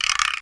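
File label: guiro; xr10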